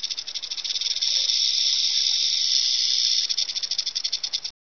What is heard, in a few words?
western rattlesnake; ambience